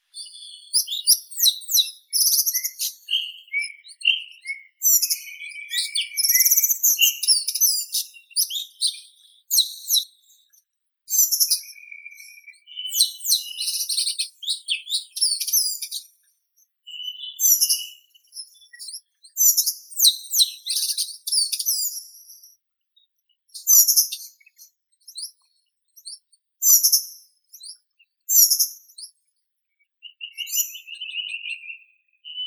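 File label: broad-billed flycatcher, Muscicapa dauurica; muholovka shirokokluvaya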